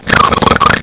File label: SNORFIT